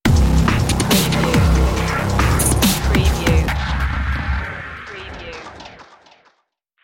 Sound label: steampunk robot stinger